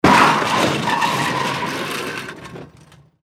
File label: cars collision